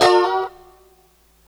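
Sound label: F 4 A mono slide L, guitars; Spectrasonics; Hans Zimmer Guitars VOL-1; 90's Sample CDs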